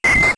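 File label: battle intense hit; SNES; SMRPG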